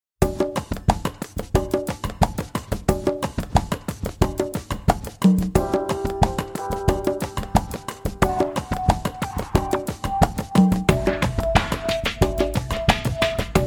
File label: Instrumental